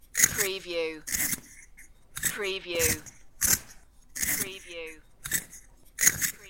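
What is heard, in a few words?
Sharpen Pencil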